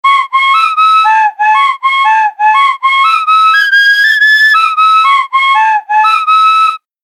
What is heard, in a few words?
Child Playing Whistle Loop